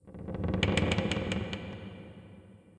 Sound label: creak